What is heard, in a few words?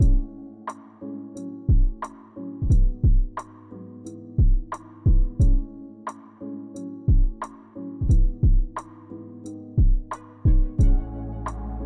Beat